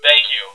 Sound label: die